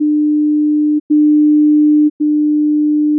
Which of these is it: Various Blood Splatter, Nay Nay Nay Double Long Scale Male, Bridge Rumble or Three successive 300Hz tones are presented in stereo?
Three successive 300Hz tones are presented in stereo